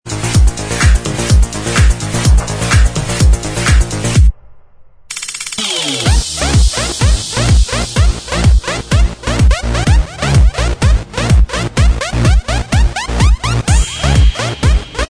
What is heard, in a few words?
dance remix Inst Track